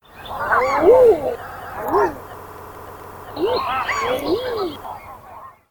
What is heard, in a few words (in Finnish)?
haahka, lintuäänet; Vesistöt; BirdLife Suomi